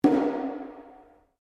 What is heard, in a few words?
DRUM TAIKO SHIME METAL BRACE F, Small Japanese Taiko drum; Miscellaneous Musical